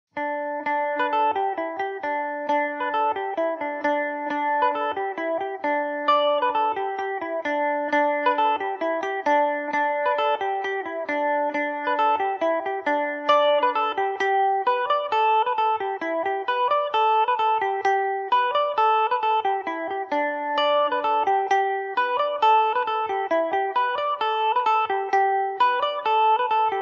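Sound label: polka, G major, Irish mandolin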